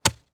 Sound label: Industry Tool Shovel Dig